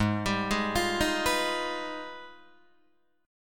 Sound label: G sharp Minor Major 13th, Guitar DADGAD; G#mM13 arpeggiated; 6,10,6,10,6,9